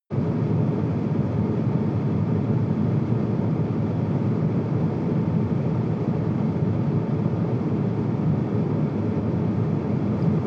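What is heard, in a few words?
sports car interior ventilation step 9-15; verkehr transport; autos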